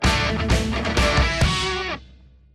Small Celebration; Disney Infinity

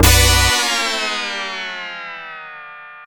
Unknown Jingle; kid chameleon genesis

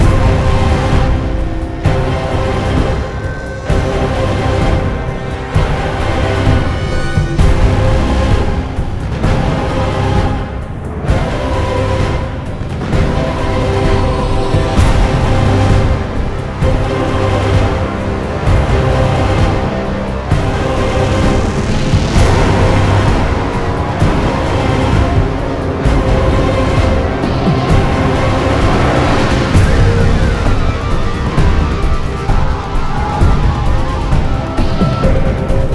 Battle Dark Epic; Waves Common Battle 04 Dark Epic

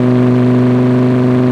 Lamborghini Aventador Low

